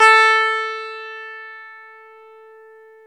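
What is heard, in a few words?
E 12 String guitar; Club-50 - Foundations Roland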